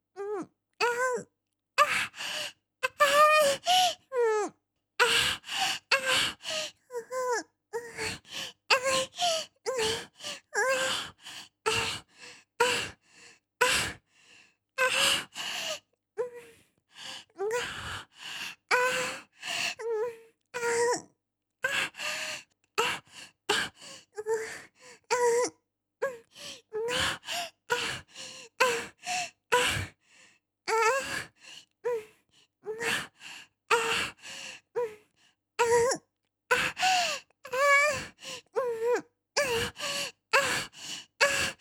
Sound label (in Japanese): 喘ぎ声 小